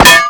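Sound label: metal pipe burst; Call of Duty Modern Warfare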